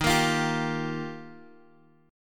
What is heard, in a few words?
D sharp Major Add 9th D sharp Guitar DADGAD, chord; 13,13,15,12,x,x; chordshape